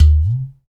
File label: UDU, Supreme Beats - African Contem; Spectrasonics; SB Udus